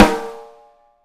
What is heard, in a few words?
OPEN BRASS, 3D Drums; 3D Sound